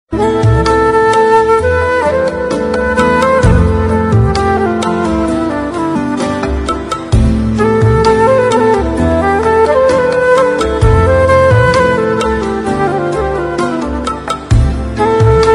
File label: Flute